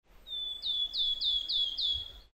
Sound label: wood warbler; bialowieza